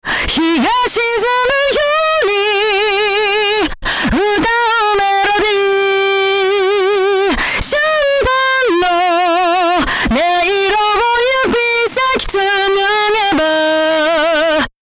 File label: Distortion Over Drive Drive High